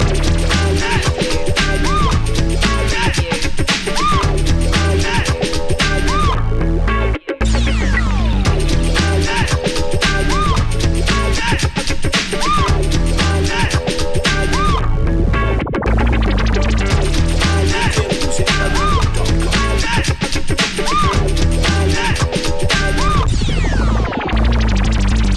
hit the decks

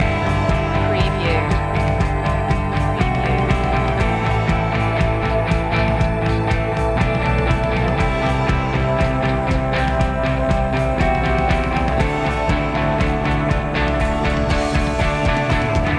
mountain mover loop

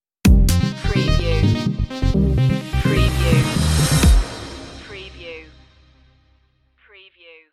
beat stinger

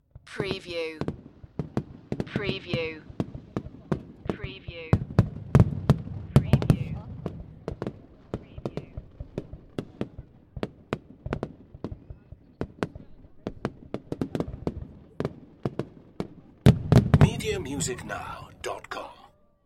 Fireworks Display Bang and Sizzle

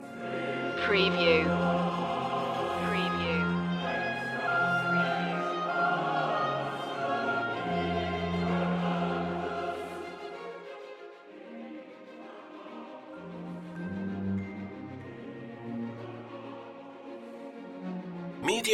falling angels loop

